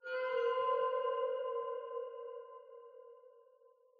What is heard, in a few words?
Diving Music Bowed; The Sinking City